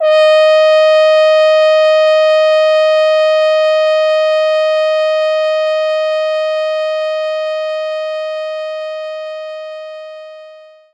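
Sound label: French Horn E sharp 4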